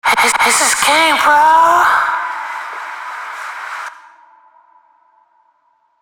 autotune viel inertia hohe snaprange dry; Rap Vocals

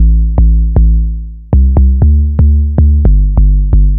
tiefbass; novation peak test

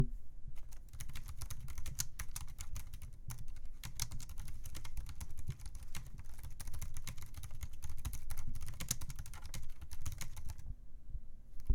tascam im2 tippen im2

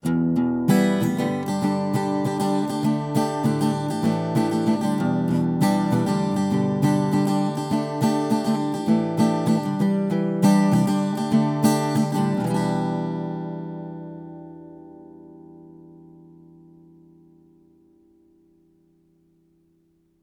Effekte A-Gitarre 610-B 500 Ohm Pre 10 dB; level7.5

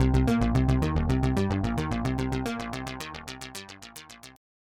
bass focusing EQ passiv sweep low to high; softube passive-active pack testbericht

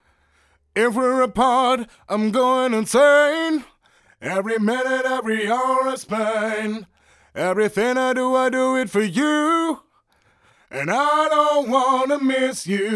Modulationseffekte zur Stereoverbreiterung Pitchshifter

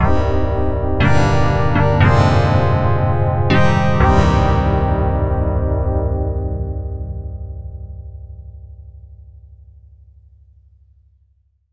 fm-synthese; klangsynthese

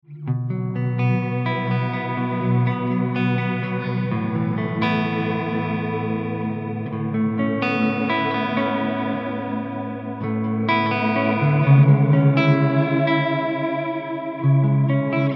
langer decay

